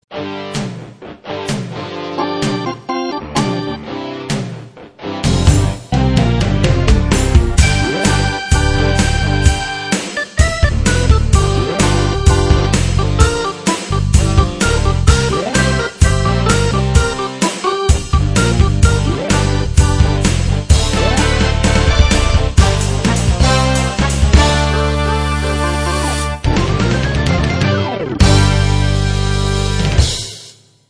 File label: hardrock